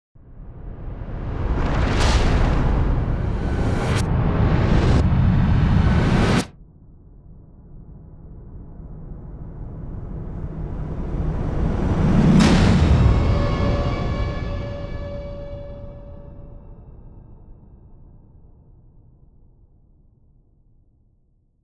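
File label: metal transition sound epic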